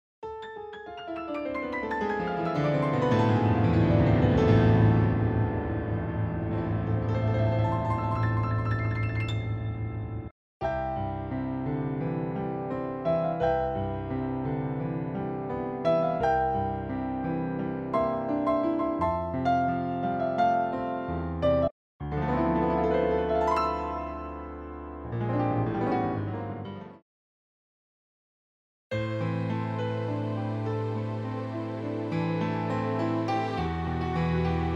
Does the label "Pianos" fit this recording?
Yes